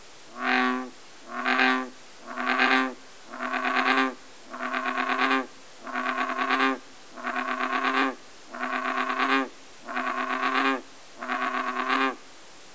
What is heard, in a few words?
bullfrog